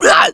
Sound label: hunter pain short